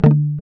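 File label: GUI D, acoustic guitar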